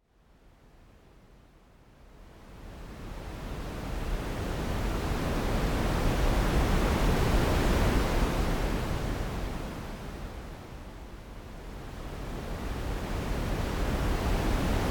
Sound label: Ocean Waves